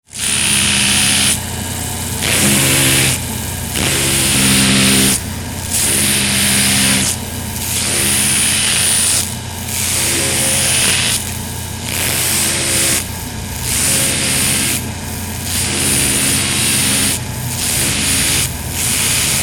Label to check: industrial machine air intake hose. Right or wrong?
Right